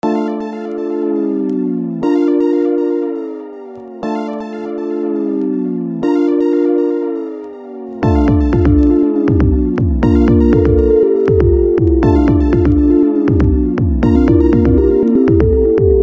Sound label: bruh beat